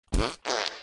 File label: fart button